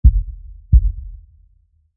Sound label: heartbeat kick a deep kick; drive